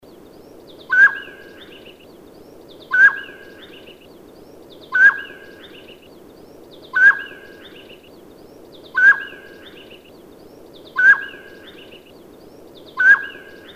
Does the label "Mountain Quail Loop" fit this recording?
Yes